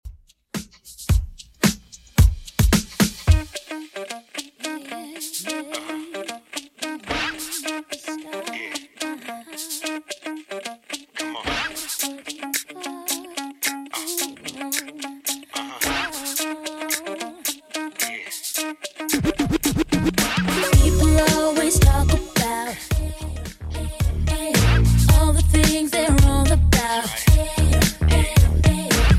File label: 2ks Redrum 110bpm; remixplanet